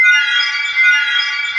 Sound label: WATER C5, Synth City; WATERFONE